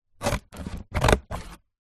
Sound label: office scissors cut cardboard